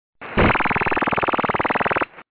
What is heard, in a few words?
UNID 9230kHz